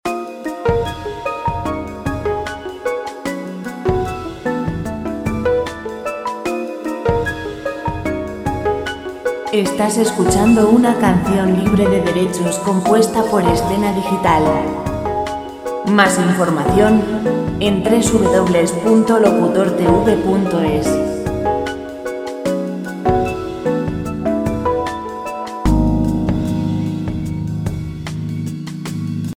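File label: 75 lento royalty free music; relaxing music background music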